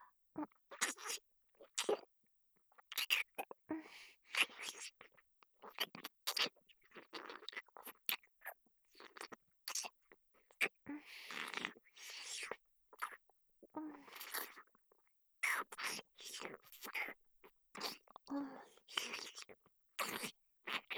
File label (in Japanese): SE無しチンポ舐め